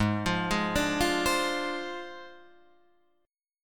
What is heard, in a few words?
Ab 6th Flat 5th Ab down Guitar Drop D, Ab6b5 chord; Ab6b5 arpeggiated; JGuitar